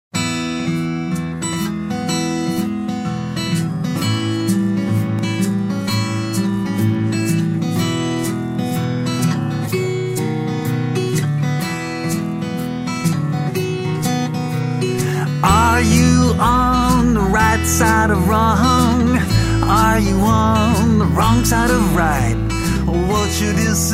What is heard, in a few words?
No Harp